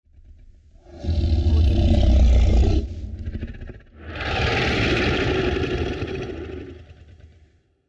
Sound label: monster roar